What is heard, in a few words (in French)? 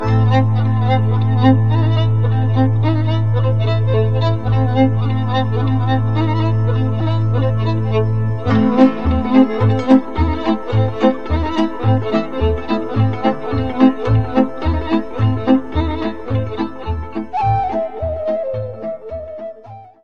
hora din caval, Roumanie